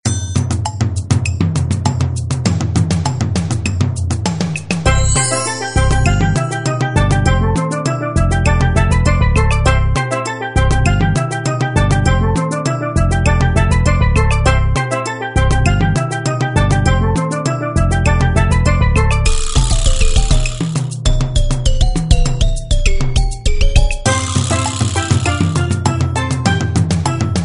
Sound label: soundtrack; samegame